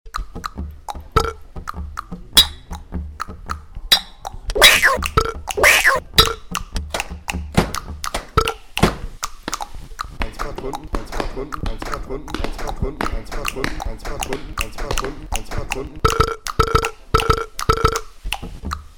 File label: remix; Our Voice on Air